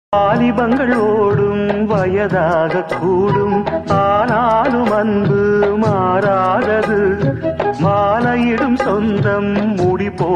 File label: magic bgm ringtone